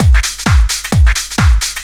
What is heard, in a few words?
groove, DRUMLOOPS